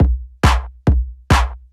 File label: drum 138, Techno; Techno ID; PS-45